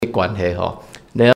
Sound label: Choir; taiwanese